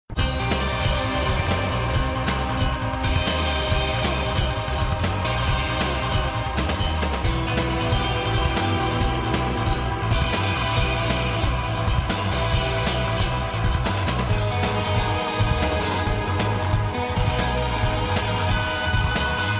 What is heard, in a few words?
instrumental; This Way Madness Lies